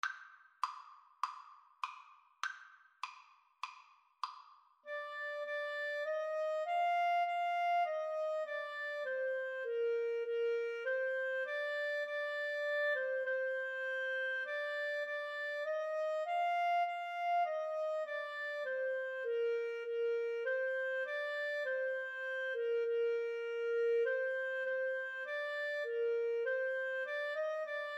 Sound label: Clarinet Violin Duet